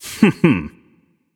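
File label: nonverbal heh heh; voicepack; smite; merlin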